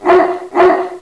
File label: GOOD DOG